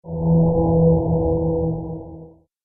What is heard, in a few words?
Trailer Accent